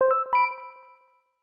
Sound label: pen alert; LG Stylo 2 LS775